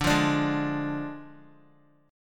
D Diminished, Guitar; x,5,5,7,8,x; 4F,4A,5C,5G,5C,6E; strummed

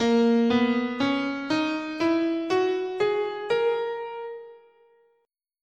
Bb Locrian

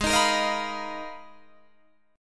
Ab Minor 6th Add 9th, Ab, Guitar, Drop D

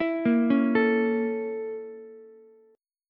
Bb Minor Major 7th Flat 5th Bb, Ukulele; BbmM7b5; arpeggiated; JGuitar

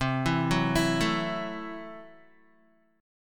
C 6th Flat 5th, C, down, Guitar; arpeggiated; JGuitar

